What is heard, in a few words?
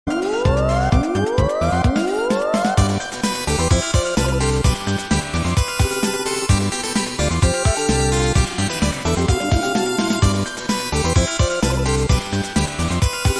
Hip Hop; Chocobo